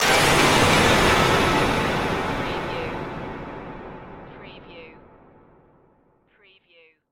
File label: Stab Chime